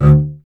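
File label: string contrabass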